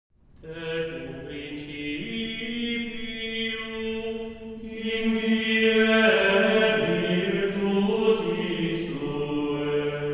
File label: psalm with antiphon